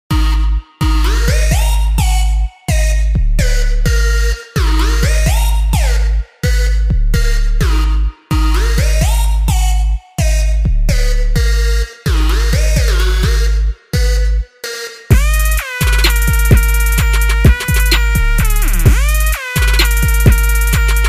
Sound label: Trap Clubbing